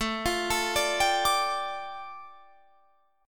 A 7th Suspended 4th Sharp 5th, A, down, Guitar, Drop D